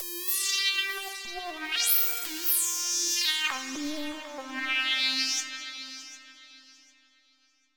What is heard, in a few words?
Synthetic Line Cmin; Ambienza